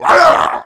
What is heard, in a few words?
Damage; Tamyu; Seer; GamigoNA